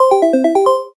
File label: Slotmachine End Melody